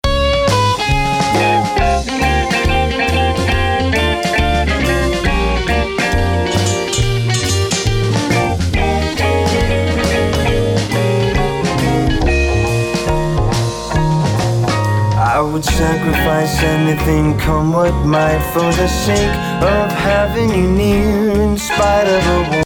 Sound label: Post solo